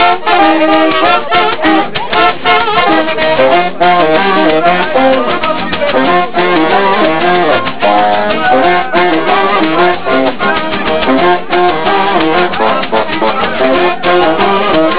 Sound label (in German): Das Band; Oktoberfest